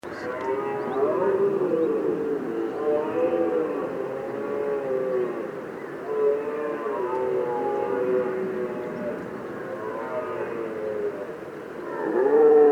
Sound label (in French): Brame